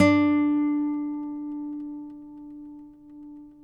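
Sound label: nylon, FLAMENCO, Guitars; Spectrasonics; Hans Zimmer Guitars VOL-2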